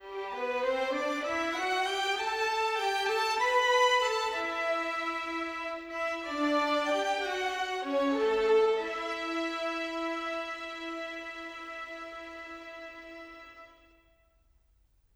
Rock-Pop Violins; Orchestral4

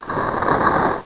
Drawer; cypher

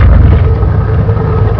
door slide; Prince of Persia

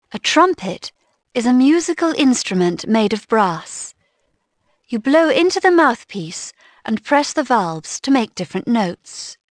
TRUM; Dorling Kindersley My First Incredible Amazing Dictionary

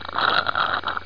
slurp; The Ultimate House of Games 2000

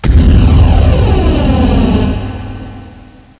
Flame

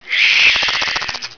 Snake

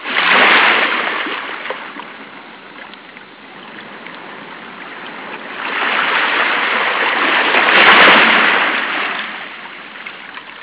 Nature waves Light; Serenesound

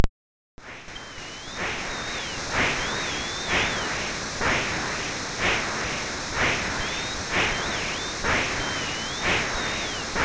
Crowd noise, SIDTunes

